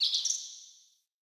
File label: Bird Double Chirp; Pothia Bird